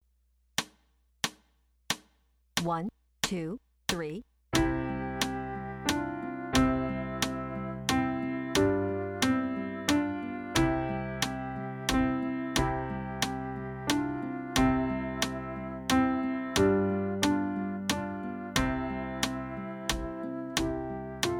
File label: French Lullaby